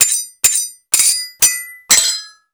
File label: SWHIT, SWORDHIT; Total Control - Action Cutz